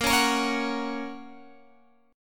A sharp Minor Sharp 5th, Guitar, Open G

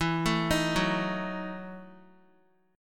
E Major 7th Suspended 2nd Suspended 4th, Guitar; EM7sus2sus4 arpeggiated

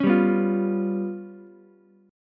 Db 7th Flat 5th, Ukulele; Db7b5 strummed; JGuitar

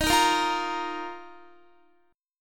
D sharp Suspended 2nd, Guitar; D#sus2; strummed; x,18,15,15,17,18

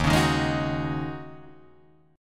Eb Minor 11th Eb down Guitar Drop D

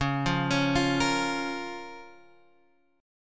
C sharp 6th, C sharp, Guitar, Open G; down; chord shape

